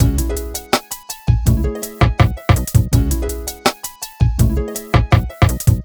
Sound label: RnB; Google; NewWave Labs